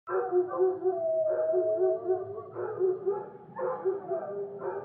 chien aboi; Chien qui aboi; Bruitages

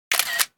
appareil photo, Bruitages

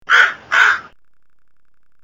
corbeau; Corbeau (2); Bruitages

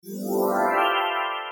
magie; Bruitages

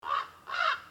corbeau; Corbeau (3); Bruitages

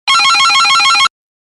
sonnerie; Sonnerie classique

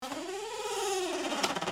grincement; Grincement (2); Bruitages

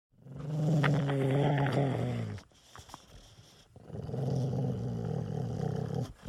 chien grogne; Chien qui grogne; Bruitages

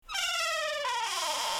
grincement; Grincement (1); Bruitages

